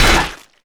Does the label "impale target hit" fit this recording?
Yes